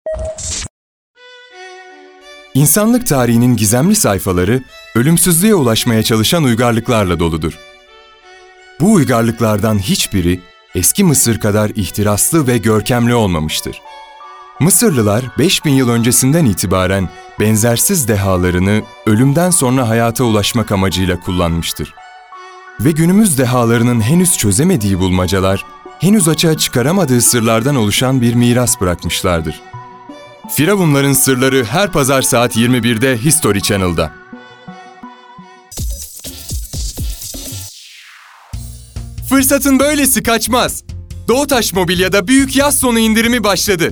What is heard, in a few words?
jingle